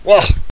cough; super trench attack 2

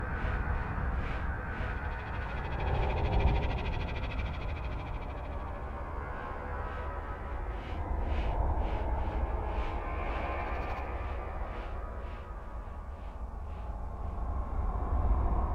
drone

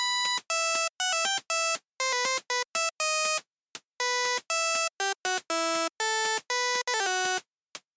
ringtone; Holy Potatoes! What the Hell?!

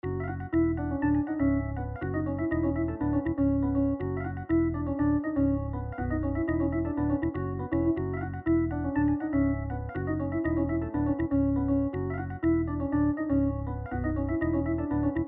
Jazz; Weekdays at Wilburs 2

